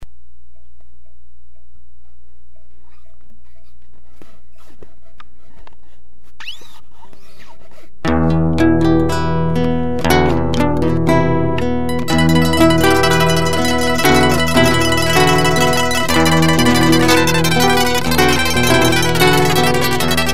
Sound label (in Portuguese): violoes